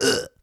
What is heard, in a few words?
Player Pain; liblast